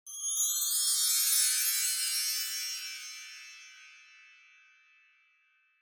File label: Wind Chimes Reverb Slow Up Low